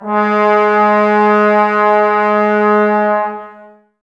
BRS TBS G#, Woodwind Brass Ensemble, Brass; Miroslav Vitous - Woodwind Brass Ensemble

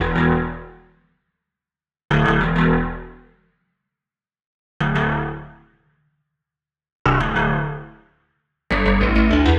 E Bass Wide FM; Zero-G; Future Wave

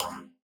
Stab; neuro; Optiv & BTK

